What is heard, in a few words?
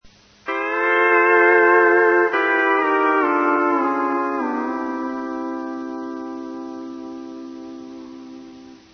E9 ending in C